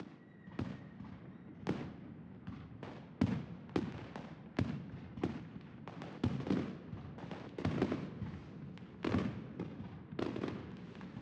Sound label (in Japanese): 室内 から 聞こえる 花火 の 音